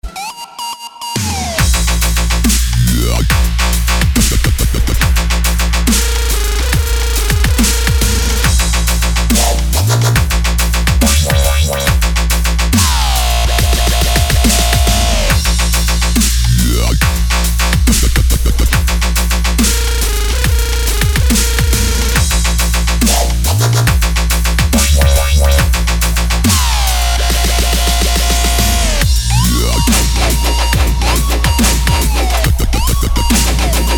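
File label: hard dubstep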